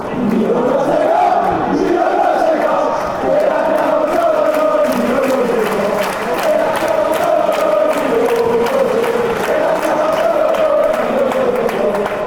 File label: lazio chant